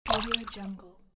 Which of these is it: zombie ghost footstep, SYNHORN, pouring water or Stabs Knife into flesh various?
pouring water